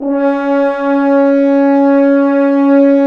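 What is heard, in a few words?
BRS, French Horns Dry f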